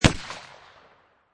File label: Pistol Rhuger 357 Single Shot Exterior At 45'